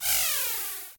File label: launch; World Of Goo